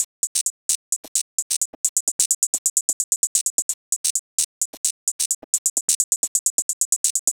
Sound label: Trance Hats 130; Percussion Elements; Abstract World Fusion